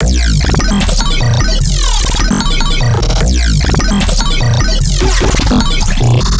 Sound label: Bass Loop X Matrix, Dubstep, 150bpm; Ghosthack; Dubstep Sound Arsenal